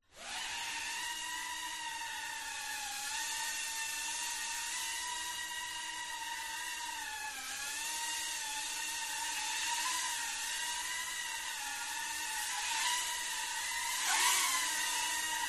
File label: Drone; fox audio